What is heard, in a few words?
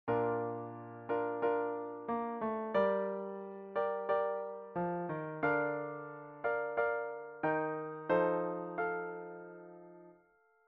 Flute Solo; christian solos